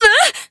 Druid Wound; battlerite

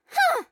Grunt Attack; paladins; Skye; Heartbreaker

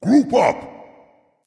Dragon; Fafnir

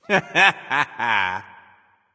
laugh; Curse Apollo; Smite

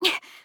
Grunt Jump; Paladins; Maeve

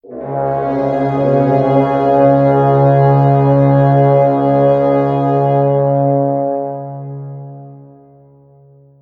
Radiant Horn

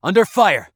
Taking Damage; Realm Royale; Assassin; Shinobi

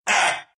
pain, ru; Rubick

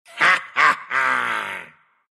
laugh, ru; Dota 2

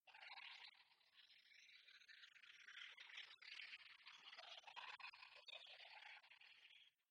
birds; Overgrown Empire

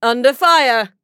Taking Damage; Radon Flux; Realm Royale

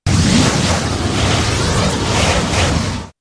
Tornado; Invoker

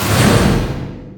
projectile launch; Vengeful Spirit

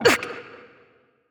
Death; paladins; Seris

